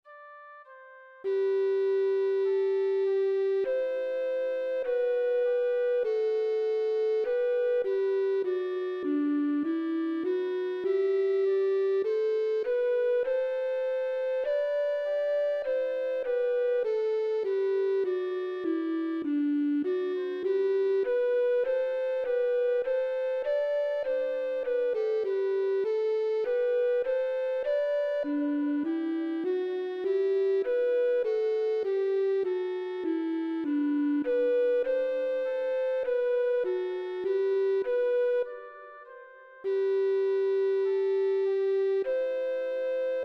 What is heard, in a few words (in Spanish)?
FLAUTA